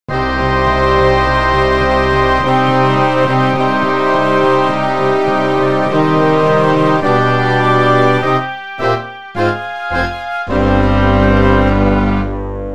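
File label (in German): Konzertino für Klarinette und Orchester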